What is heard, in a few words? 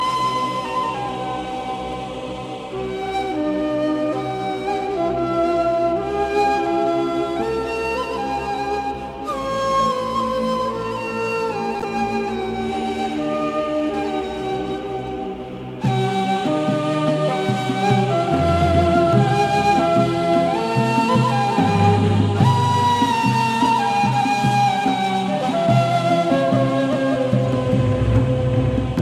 Turkish Ney Sound